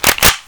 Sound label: Rubiks Cube Rotation